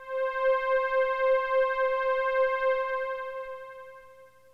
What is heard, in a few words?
juno pad, strings n pads; lmms